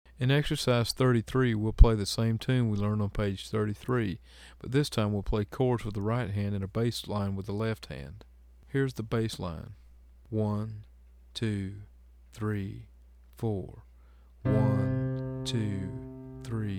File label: Chords With A Bass Line